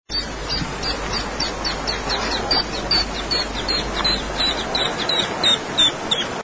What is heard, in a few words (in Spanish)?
espinero pecho manchado